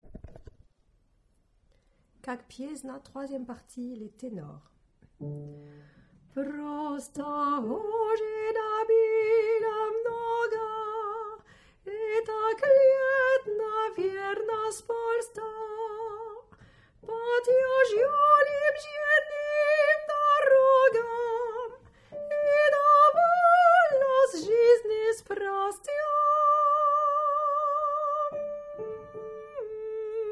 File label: Tenor